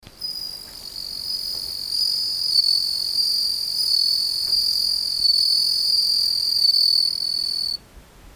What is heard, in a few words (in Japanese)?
suzumusi